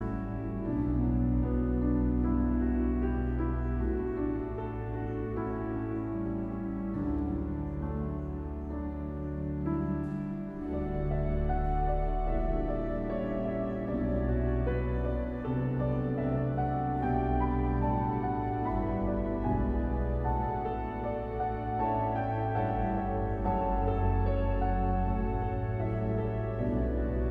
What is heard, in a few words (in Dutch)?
orgel en piano; Wesley S.S. - Lead me Lord